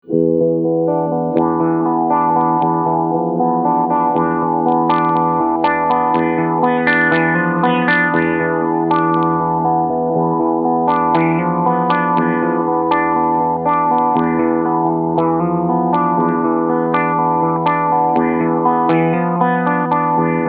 Keeley Neutrino Envelope Filter Lowpass HI Cleansound